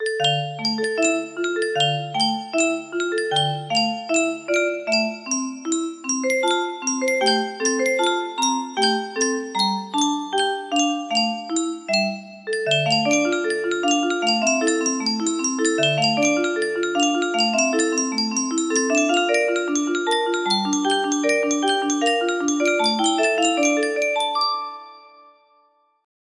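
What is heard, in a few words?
piano medley, music box melody; game of thrones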